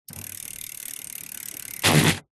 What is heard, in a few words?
vehicles bicycle wheel spin air down tyre skid stop; zapsplat